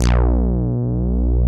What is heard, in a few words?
DRY BASS; Masterbits; Dance Trax